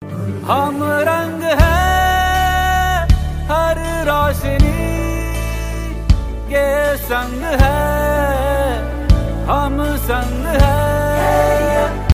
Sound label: hindi ringtone, bollywood ringtones